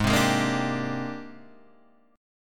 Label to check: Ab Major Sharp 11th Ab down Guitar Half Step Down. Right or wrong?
Right